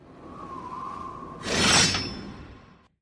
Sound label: breath; demon slayer